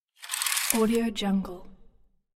household curtain drawn; blazznet

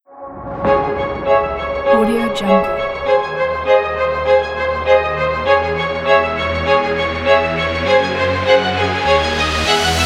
dramatic sport trailer kit

